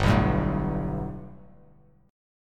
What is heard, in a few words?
G Major Add 9th chord; Gadd9 strummed; JGuitar